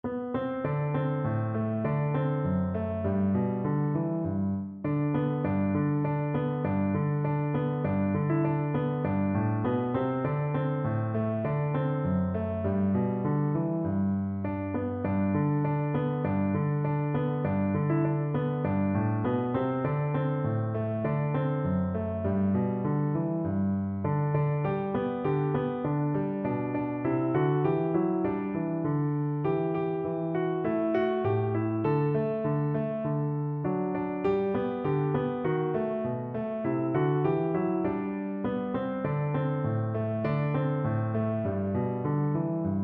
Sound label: the caissons go rolling along, piano